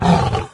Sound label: melee, doom3 pinky